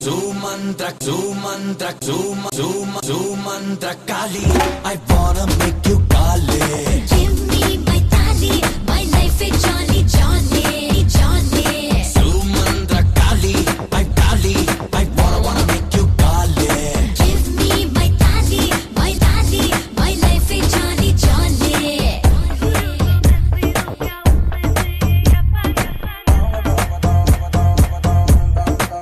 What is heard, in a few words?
Mantra Remix